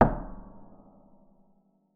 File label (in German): KLOPFEN; Xsample VOL-7